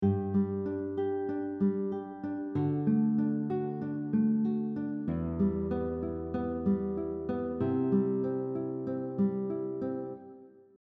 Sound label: Fingerpicking pattern 6 two notes on a beat 2, guitar; This is another example of playing two notes on a beat and then adding more notes to beats 3 and 4